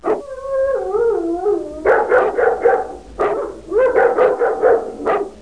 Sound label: Dogs in the background